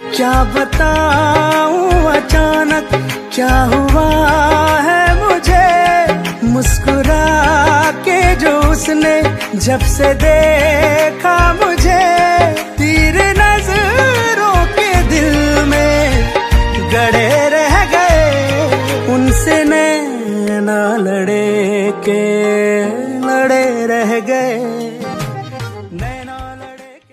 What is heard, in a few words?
hindi punjabi ringtone; english ringtones